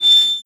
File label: move, hinges drawers; Half Life Alyx; tap